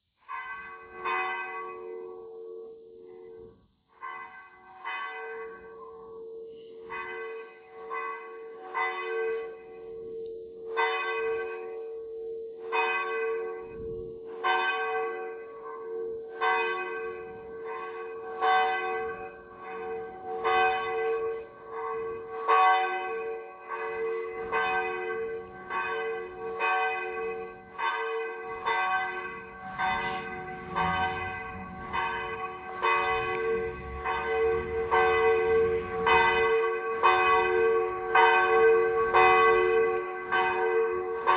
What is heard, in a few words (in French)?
cloche